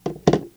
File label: Ammo rocket; I Am Weapon Revival